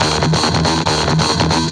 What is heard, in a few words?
Treat Guit, Industrial Nasty